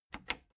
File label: plastic button latch loose click disable fast